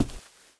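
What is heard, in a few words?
player wood scrape; svencoop; sence; mistake coop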